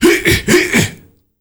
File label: HIEH HIEH, World Voices, AFRO; ILIO; Vocal Planet VOL-5; AFRO NOISE